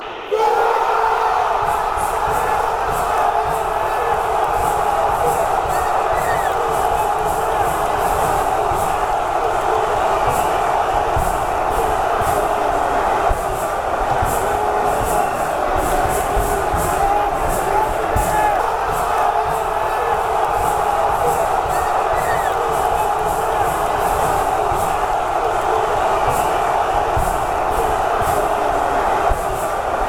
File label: goal, fanchants